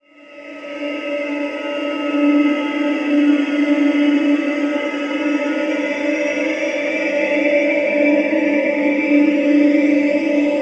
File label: texture infinity D, One Shot Textures; Transmission-X